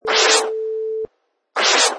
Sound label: DJ Single Scratch